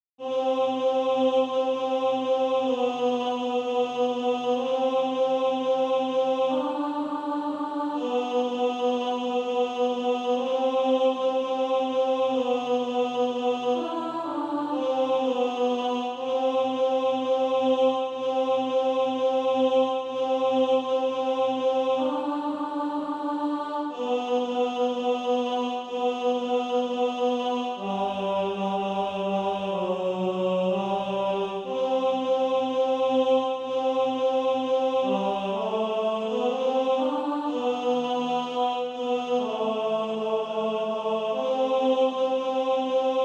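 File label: tenor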